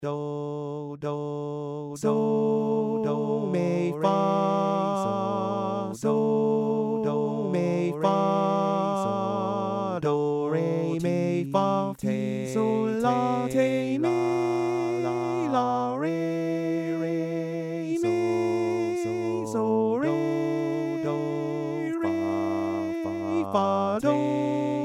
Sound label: duo Dm 120